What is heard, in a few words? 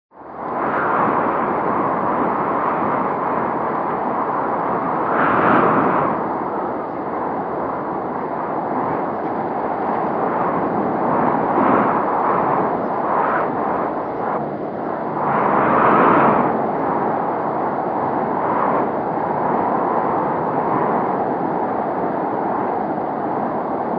wind